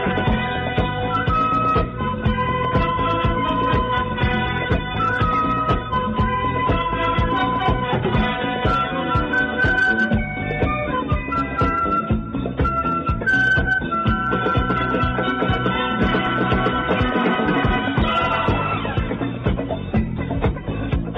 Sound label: Music Ringtone